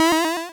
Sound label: Cry